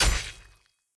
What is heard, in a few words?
Attack Sword